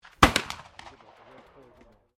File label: MUSKET FLINTLOCK 50 CAL AMERICAN FRONTIER REPRO FIRING; Gun Shot; Black Powder Muskets; Gun Shots